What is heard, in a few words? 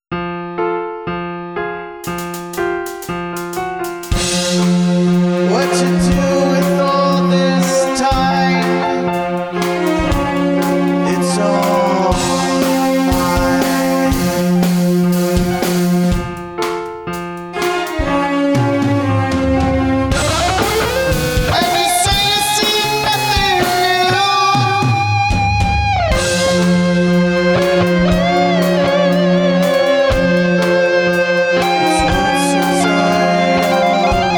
red piano